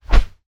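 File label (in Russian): звуки копья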